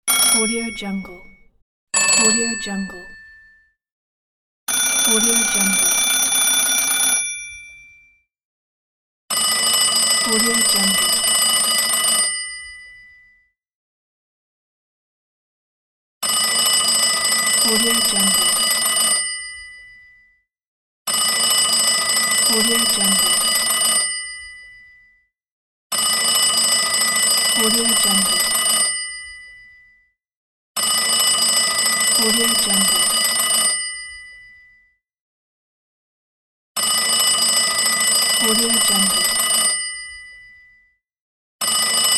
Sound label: old phone rings